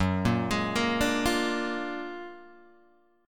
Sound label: F sharp Augmented Major 9th F sharp Guitar Open G